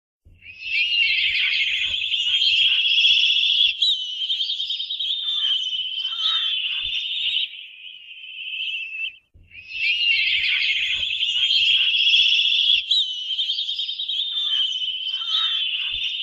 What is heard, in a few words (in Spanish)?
Sonido del milano canto ave Real y negro